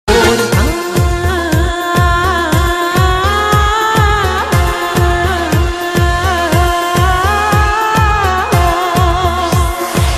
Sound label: Pashto